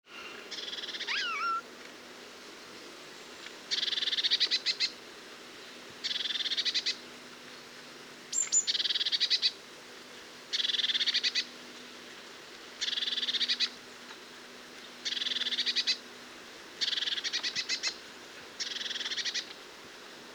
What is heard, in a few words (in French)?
mesange bleue